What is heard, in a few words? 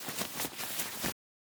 Wooden Building